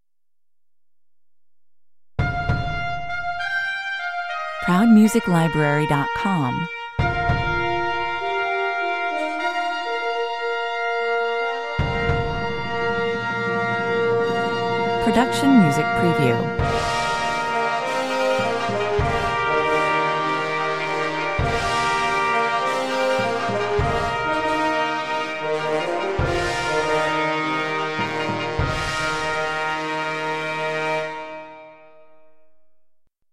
fanfare 103 bpm

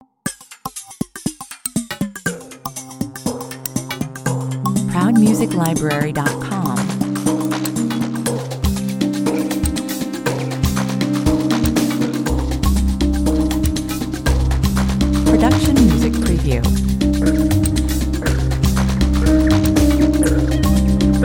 latin trip 120 bpm

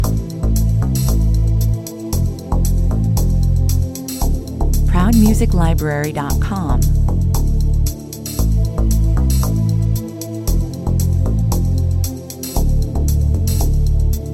a thump 115 bpm

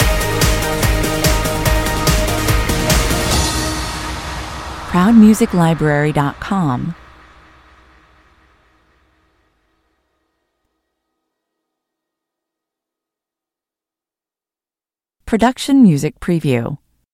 fresh energy stinger

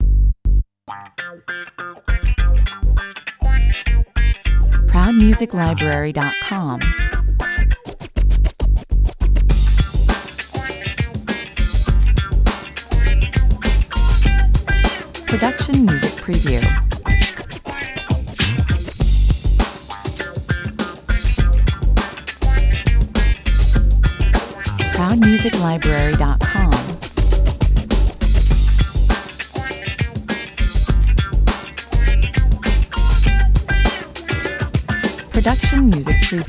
hip and hop, 101 bpm

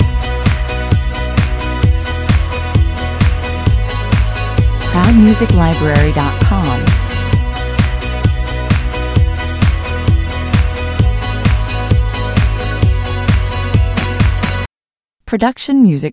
neon guitars loop

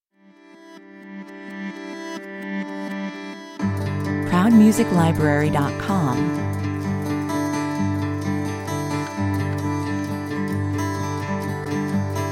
guitars only

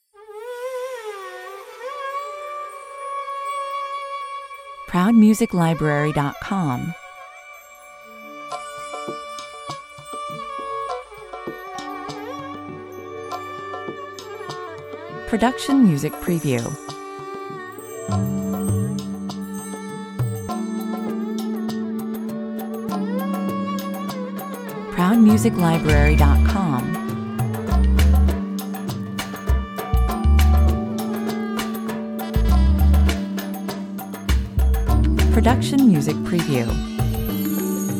relaxing maharaja 100 bpm

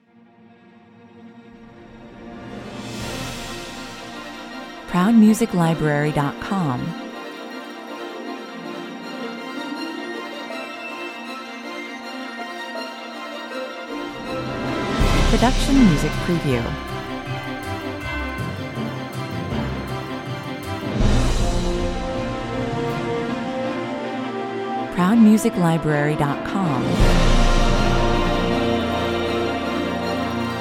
movie trailer positive 80 bpm

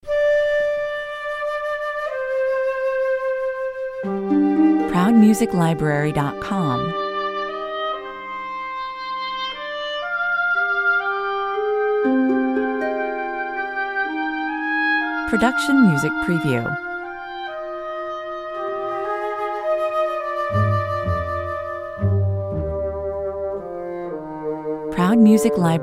melancholy dialogue 60 bpm

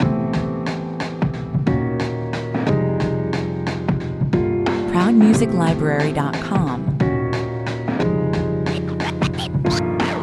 malfunction 90 bpm

